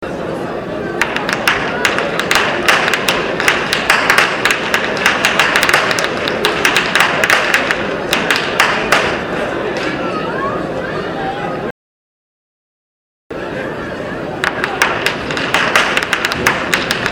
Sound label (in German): schnalzen; jugend; feldkirchen